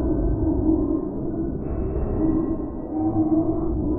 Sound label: Sample n Hold Verb Loops, sparse soundscape